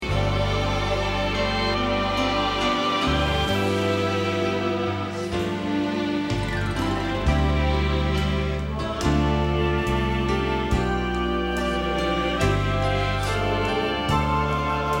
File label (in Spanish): Bajo